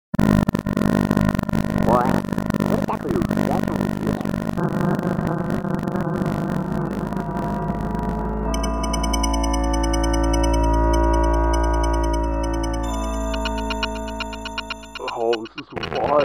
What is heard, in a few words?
freeway jam large scale interactive sound installation